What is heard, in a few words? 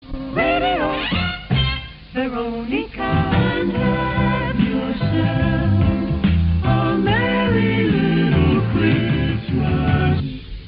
Xmas jingle; Veronica